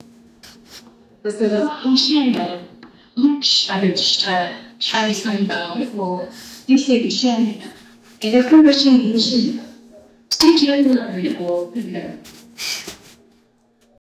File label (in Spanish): entradilla de telediario, tv